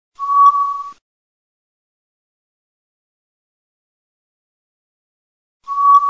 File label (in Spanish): GALLINAZ AUL